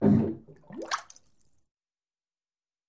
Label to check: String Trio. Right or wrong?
Wrong